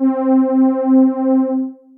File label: Captain Padd, Synth Pad; Instruments; Ableton; Synth Essentials; Operator